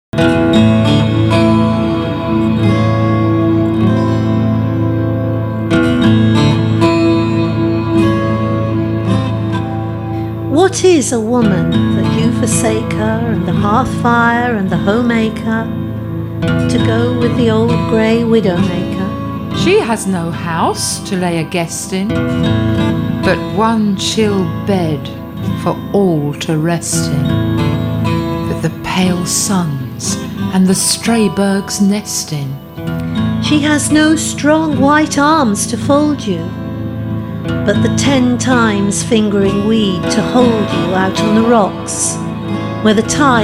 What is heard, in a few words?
harp song